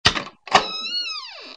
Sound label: door; CLANNAD FULL VOICE; Key